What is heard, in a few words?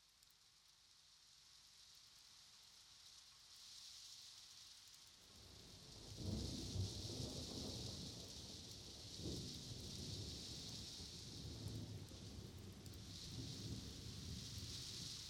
Thunderstorm Inner Perspective; Nature Sounds; Sounds of Nature Collection